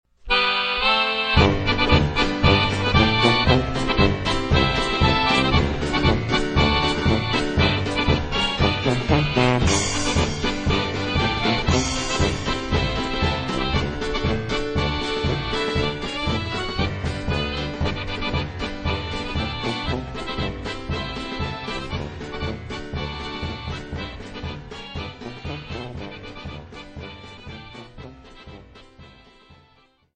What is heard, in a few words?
minnesota polka